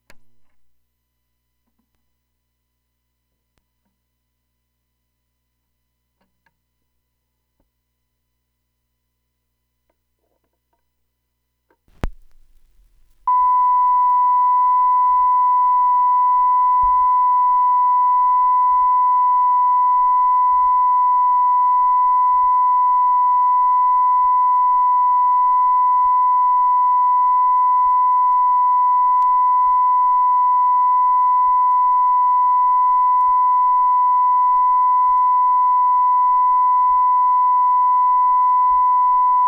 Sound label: 1kHz Reference Tone, Audio-Technica AT-LP2D-USB; Turntable; Ultimate Analogue Test LP